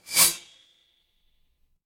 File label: impact sword